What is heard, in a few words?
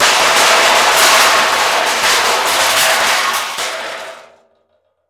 crash